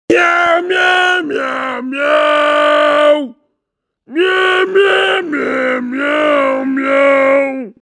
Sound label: miauw miauw